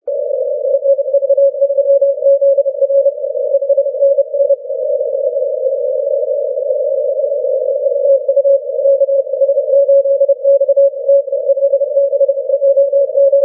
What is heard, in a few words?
17m cw